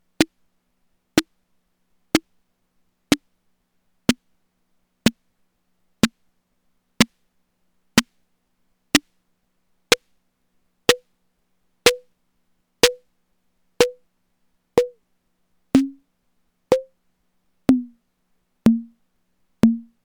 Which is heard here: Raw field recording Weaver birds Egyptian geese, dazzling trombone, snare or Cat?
snare